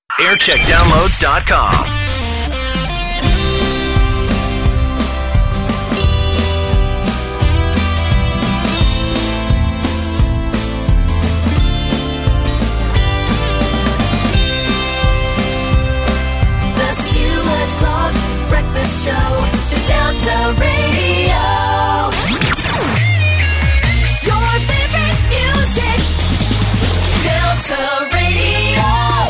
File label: jingle montage; delta radio; 2008